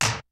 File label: kicks snare, kid; Kid Nepro; Elite; KICKS SNARES